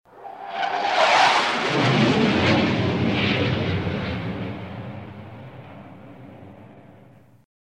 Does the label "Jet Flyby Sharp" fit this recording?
Yes